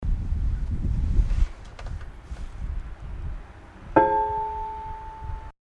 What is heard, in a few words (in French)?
cloche; Veyrières